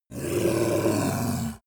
yeti growl warning tense; kreaturen

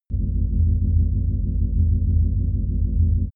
digital idle smooth, atmosphaeren

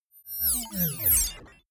screen shut down glitch; energie

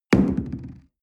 coffee place tablecloth hard wobble

